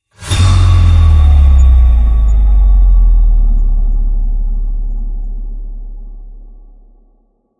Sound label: Impacts; Gothic Storm Music; Advanced Sound Design 2012